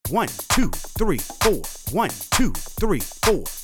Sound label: loop 132